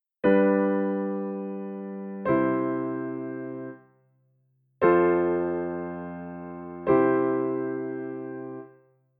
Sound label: The plagal cadence